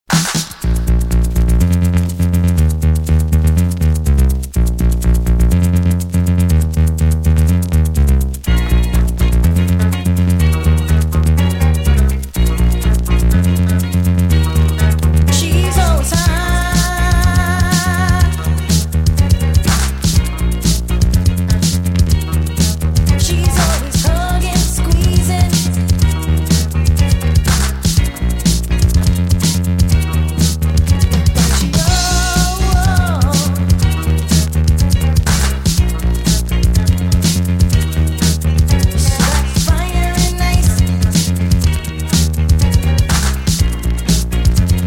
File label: (instrumental); disco